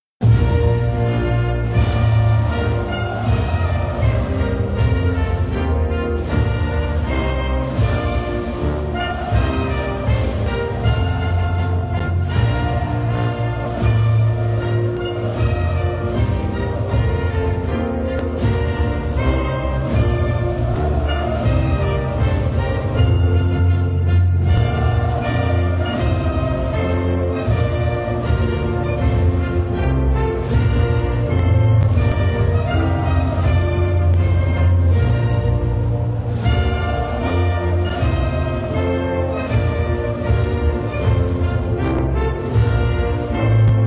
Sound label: Spanish National Anthem; EURO 2016